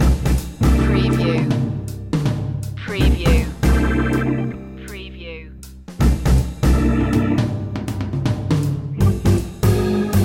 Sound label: kids blues loop